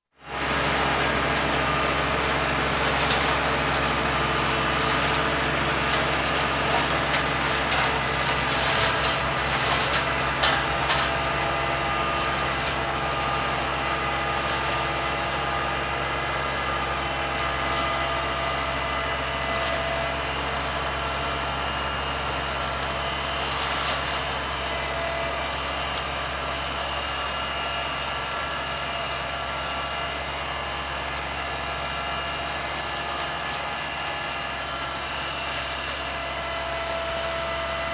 Tractor with Hedgecutter, exterior, cutting hedge round field (Varying perspective); Farm Sound Effects; BBC